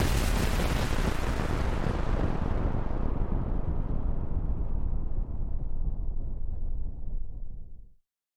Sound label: White Noise Explosion